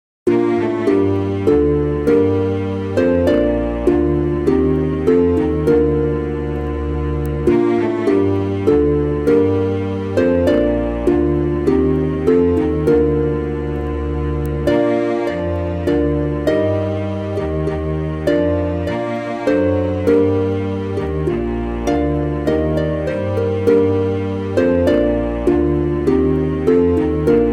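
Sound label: chordophonet virtual celtic harp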